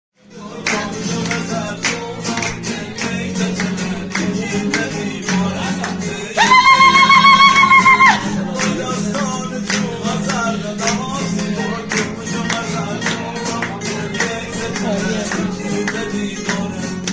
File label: gitar